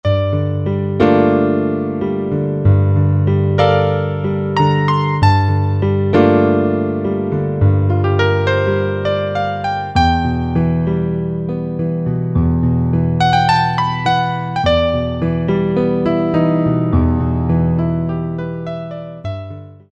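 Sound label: Piano; Sonic Reality Modern Songwriter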